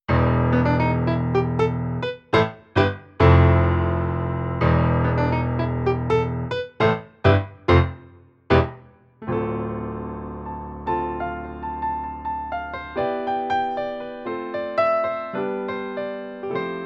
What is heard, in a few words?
CA67 Pop Grand Piano